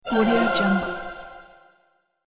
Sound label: angelic magic wand